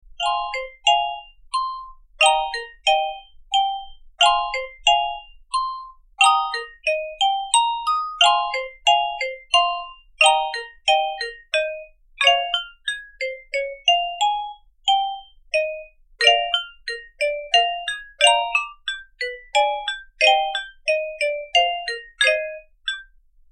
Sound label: phone